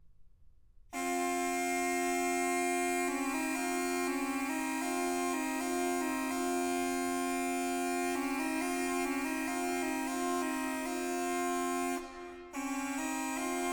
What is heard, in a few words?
Aulós